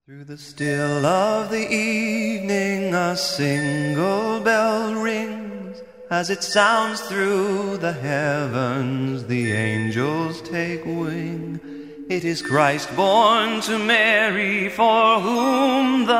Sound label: Christmas Bell